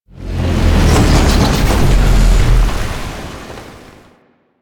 Void Dragon Shake; Warframe; Duviri

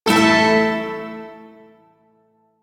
Shawzin Chromatic Chord; Warframe; Duviri Shawzin

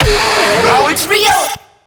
Pain

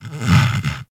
interact sleep snore, Kubrow; Enemies; Warframe; Tame

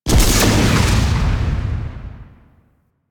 Archwing Scythe shot; Melee; Warframe; Weapons; Exalted Archwing Scythe

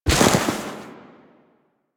Step Snow, Footsteps

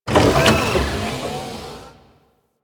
Servo Flutter, Ambience; Warframe; Corpus Railjack

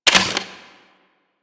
Fist Movement; Enemies; DE; Warframe; Tyl Regor; Fists

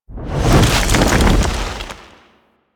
Reaper Idle; Warframe; Wraith

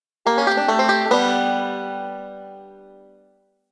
Spear Fishing Banjo; Warframe; Weapons; Eidolon Fishing Spears

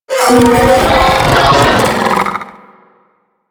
Sentient Vocal Attack, Enemies; Warframe; DE; Sentients; Ortholyst

